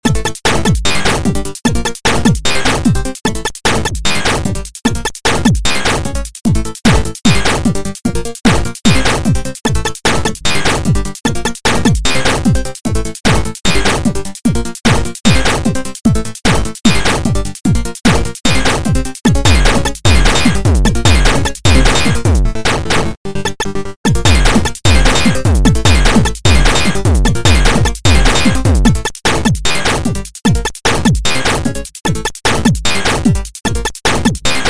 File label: chip